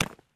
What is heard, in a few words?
ball bounce; Gumball - Go Long!